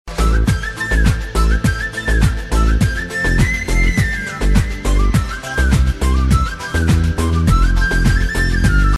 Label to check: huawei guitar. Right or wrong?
Right